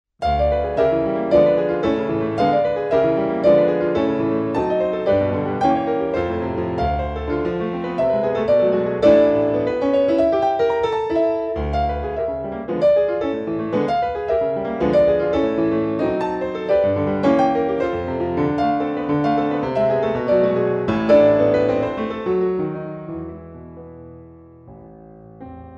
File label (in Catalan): Piano Solo